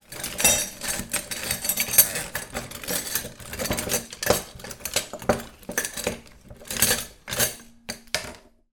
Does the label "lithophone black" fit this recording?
No